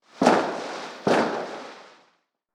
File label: Fireworks bang in sky; ban phao hoa